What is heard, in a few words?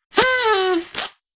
party blower; taunt; terrytarget